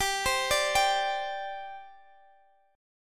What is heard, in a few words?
G Suspended 4th G down Guitar Open G